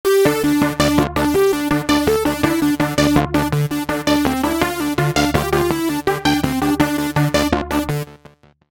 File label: sixths